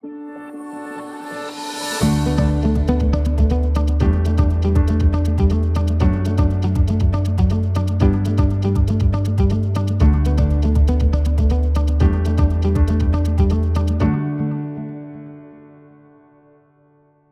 upbeat inspirational guitar piano and percussion groove; 15 seconds